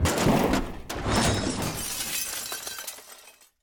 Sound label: Kick Cupboard; LOOPERS